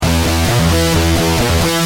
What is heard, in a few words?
G Pump, Guitar; Industrial